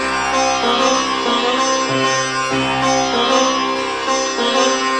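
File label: sitar drone rhythm, 096 bpm; WORLD LOOPS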